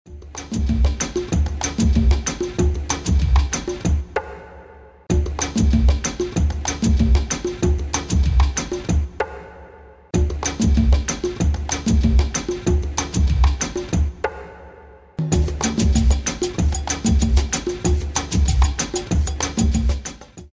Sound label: Rhythm of Samba